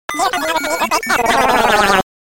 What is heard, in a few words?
earthquake; chinese